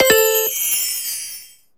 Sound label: SE GAME SLIDE; maimai